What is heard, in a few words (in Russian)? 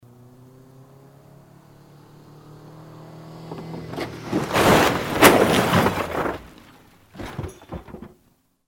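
каскадерский трюк машина въезжает и падает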